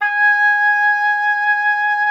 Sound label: OBOE G#5; Xsample VOL-6